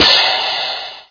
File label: cymbal; TomCat